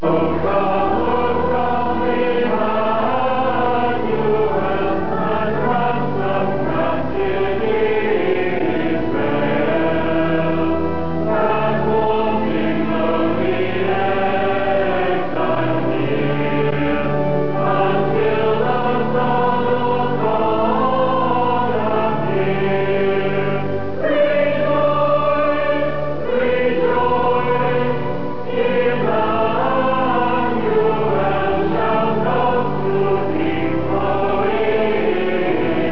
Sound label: choir